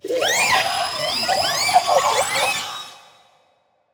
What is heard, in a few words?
BABY MOLOCH alarm